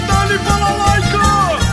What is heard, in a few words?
balalaika